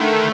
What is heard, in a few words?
Zap Chord, Pads; Drum n Bass; KJ Sawka; Drum n Bass & Beyond